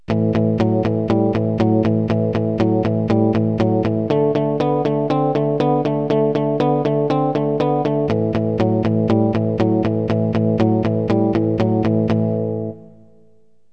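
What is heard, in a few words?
mute guitar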